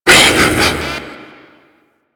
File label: Ape Prelate Attack Short G; Enemies; Warframe; Proto Infested